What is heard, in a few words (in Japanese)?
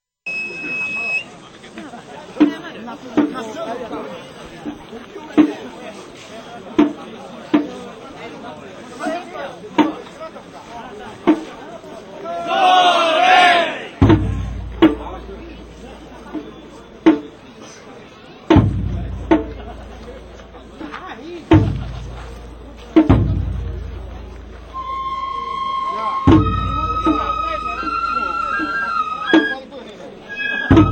本囃子, 神楽囃子; 本囃子～前半; 別名「まぬけ」神楽囃子; 半田市乙川浅井山「宮本車」